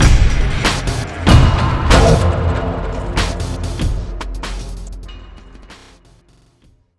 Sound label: outro